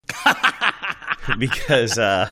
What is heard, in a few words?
awesome laugh